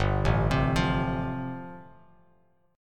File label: G 7th Suspended 2nd Suspended 4th, Bass Standard; G7sus2sus4 arpeggiated